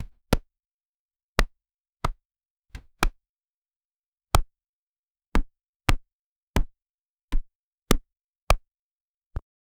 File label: fight